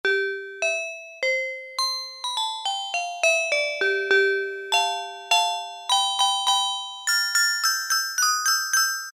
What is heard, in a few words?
Glockenspiel, Roland PN-D50-02